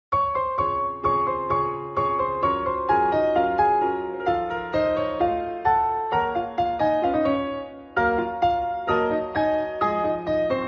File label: piano ver.